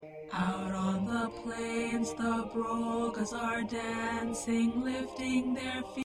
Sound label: Tenor; Carol Of The Birds; holiday songs